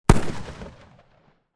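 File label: m 30 carbine exterior shot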